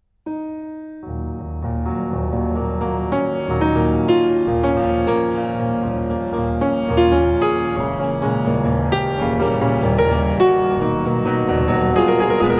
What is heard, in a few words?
Etudes-Tableaux in E-Flat Minor